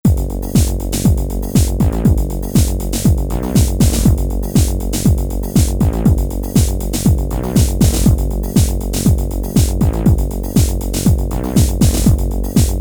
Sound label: Techno